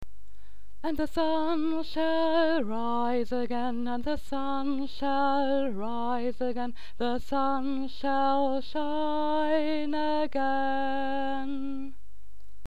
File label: yule log song 2nd voice